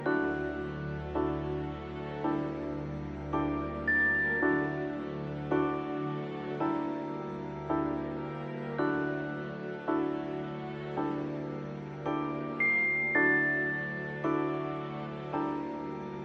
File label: Music Attic Loop